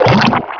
OIL DROP

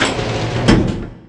GARAGE DOOR

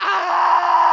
FALLING DEATH

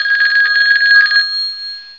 PHONE RING

